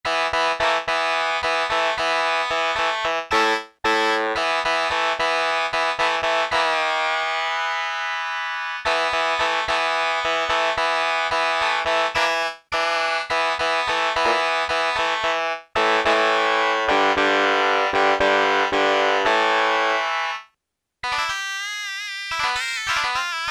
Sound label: ROCK GUITAR, Yamaha SHS-10